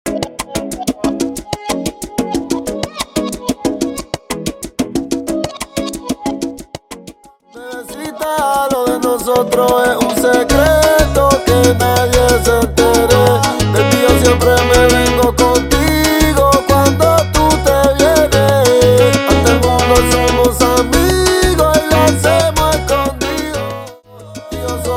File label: Salsa To Reggaeton 92 BPM; transition